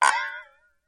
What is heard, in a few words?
rollover flower cursor changes to can; activity center; backyard; preschool time